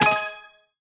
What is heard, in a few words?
collect; Mummy